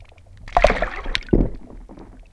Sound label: hydro fish splash, underwater